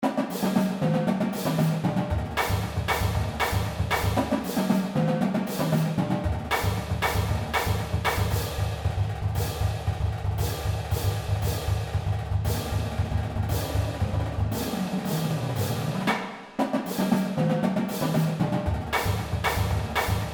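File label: Sound Sample, PERCUSSION MUSIC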